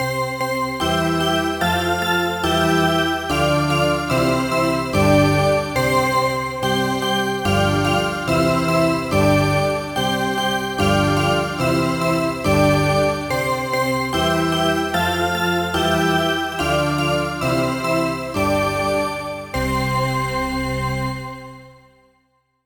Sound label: santa pad